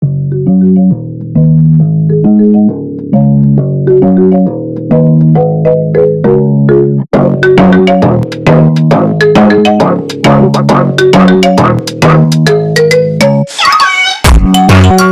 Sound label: brazilian phonk